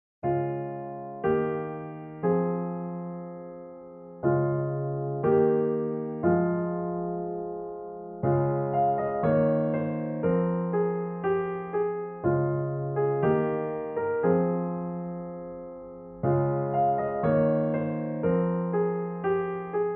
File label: Piano